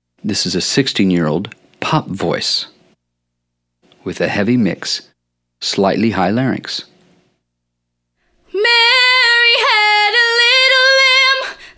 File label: Mix Examples female; Exercise 7; singing lesson